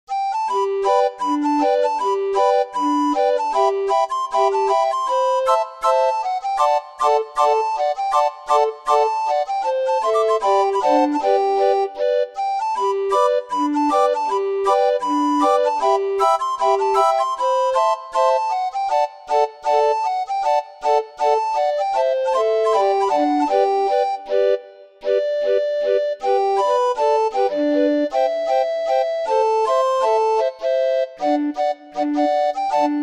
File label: Golden slippers, orgue barbarie